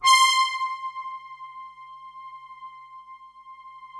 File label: brass pad, Mirage, C5